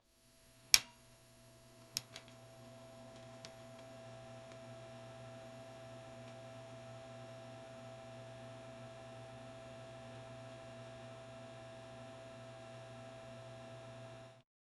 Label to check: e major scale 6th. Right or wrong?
Wrong